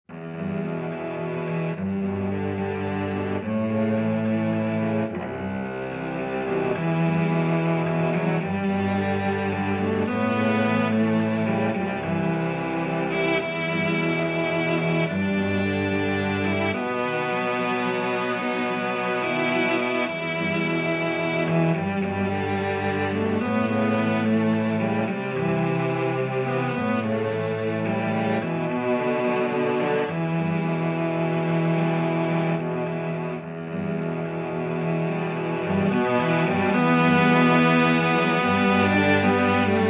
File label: cello quartet